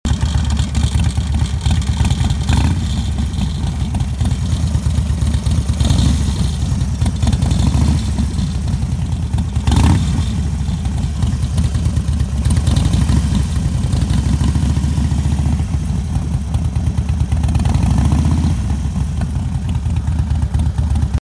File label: engine noise, sound familiar